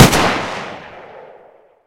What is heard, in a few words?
rifle, Gunshots; Weapons Guns